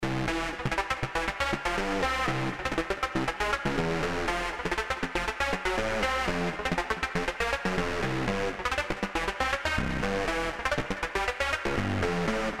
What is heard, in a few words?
Synth Spring